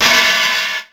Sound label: Open Hat, Ensoniq ASR-X Pro